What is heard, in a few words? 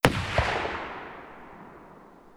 ssg08 fire dist; vj weapons